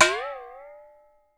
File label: waterphone